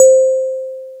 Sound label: DX PIANO